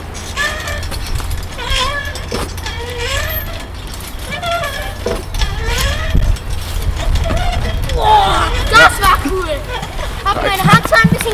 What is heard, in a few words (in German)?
Schaukel